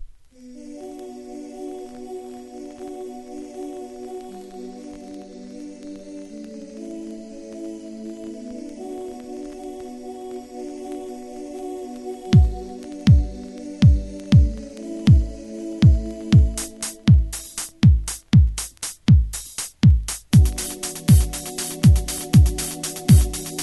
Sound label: Chime; Orbital